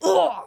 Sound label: Grunt Voice